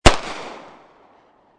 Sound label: M9 9mm Bridge; VRSonic; Guns